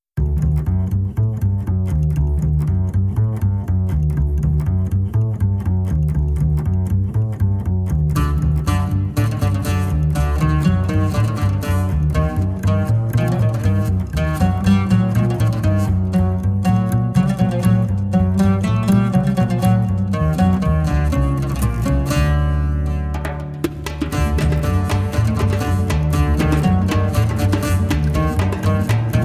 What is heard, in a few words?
longa oriental